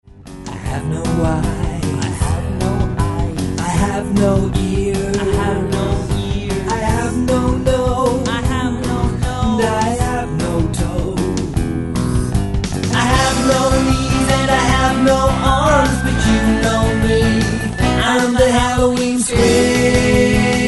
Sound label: halloween scream